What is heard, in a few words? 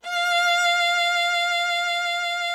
VIOLA F4; Greytsounds VOL-1; VIOLIN+VIOLA